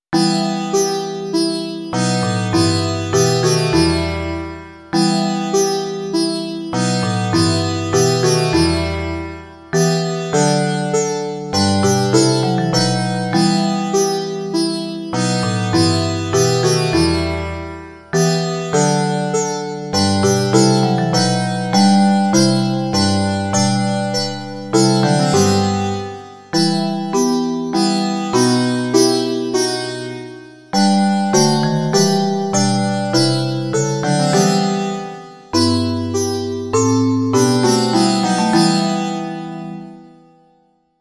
sitár a vibrafon; Rač, Bože, požehnati; Imaginativní verze

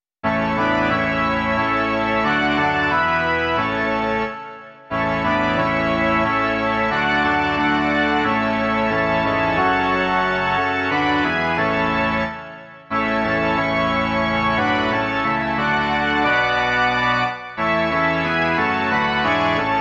varhany; Pod ochranou Nejvyššího

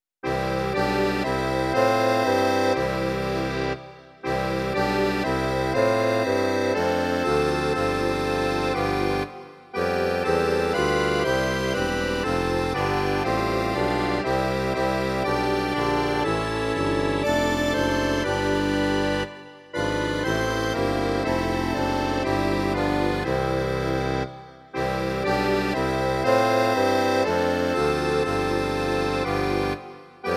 akordeon a kontrafagot; Když vycházíme z domu tvého; Imaginativní verze

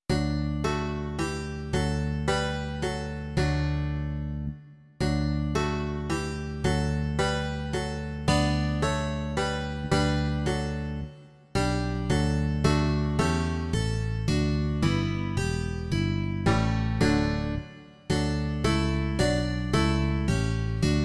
kytara, banjo a baskytara; Ó jak rád slyším; Imaginativní verze

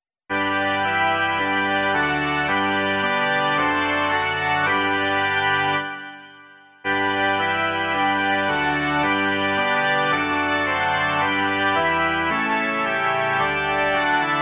varhany

